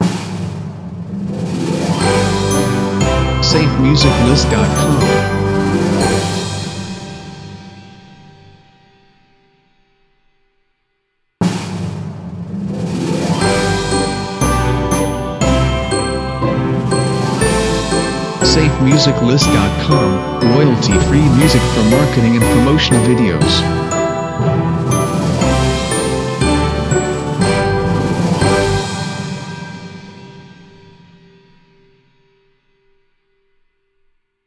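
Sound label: Christmas Video Greeting; SafeMusicList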